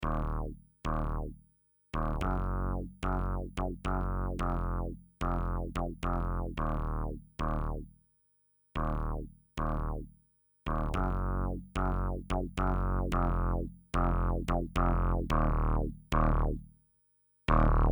Art Tube MP Sättigung

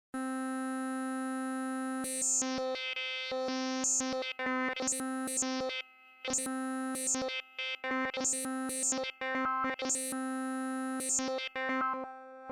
MicroFreak Pressure AT auf Wave Timbre Cutoff und Pitch

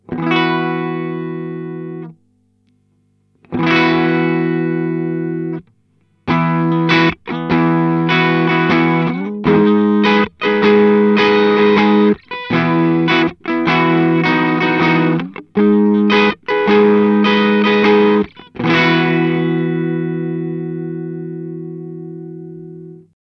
Earthquaker Devices Zoar alles auf 12 Gain auf 7 Uhr

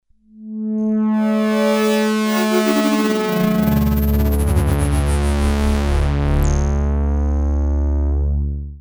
P600 Zwei Sounds gelayert in DAW; gligli

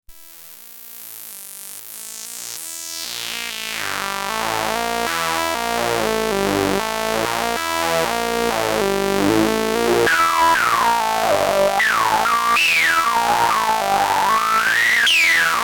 Semi-Modular Highpass mit Resonanz sehr aggressiv

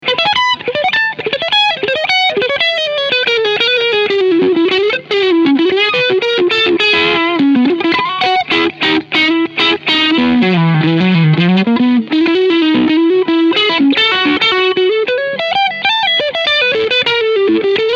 Prism Color Modus Medium alle 12 Uhr; Jackson Audio

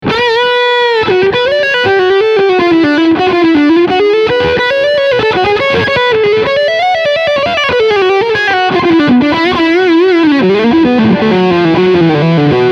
Leadsound Steg HB

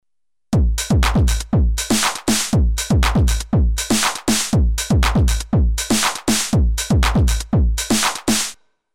Drums Messing Light

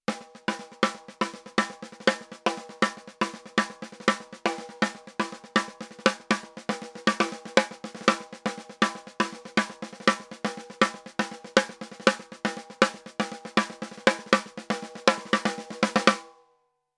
MPEQ 1 SD 1073 mit Transformator; UnderToneAudio

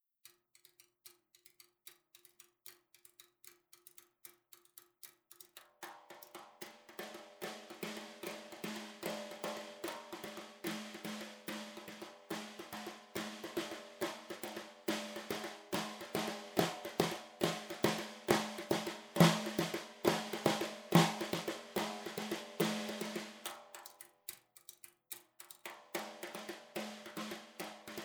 Lewitt LCT 1040 Mapex Snare Brush Overhead Niere Clear

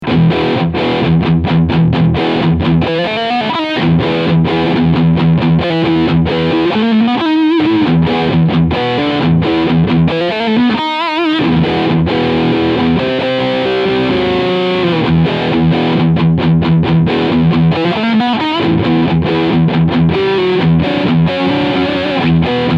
ESP E II Eclipse Steg Humbucker Overdrive

